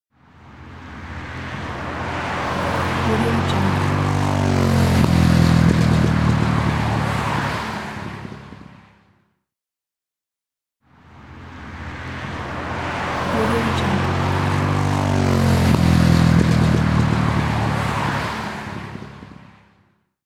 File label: motorcycle passing by fast; soundfoodsfx